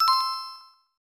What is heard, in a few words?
Jewel Chime; Doraemon; SNES